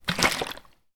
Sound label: household water spray bottle shake water inside; sound effects one